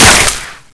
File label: gravel, debris